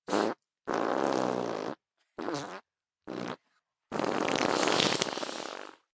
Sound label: Mouth Multi Farts, Mouth effects, 1 child, multiple mouth farts, human voice, kid; Sound Effects:Human; VoiceBox